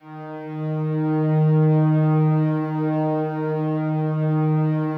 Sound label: slow strings D#2, Yamaha VP-1; 90 s Sample CDs; Music Store